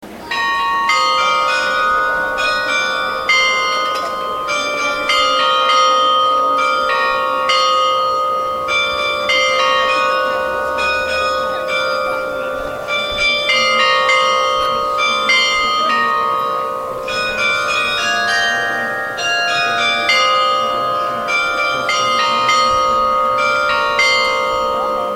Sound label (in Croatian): zvonovi